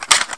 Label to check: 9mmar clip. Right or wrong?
Right